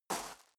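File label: step dress boots gravel medium slide short, footsteps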